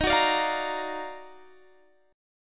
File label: D sharp Minor Major 9th D sharp down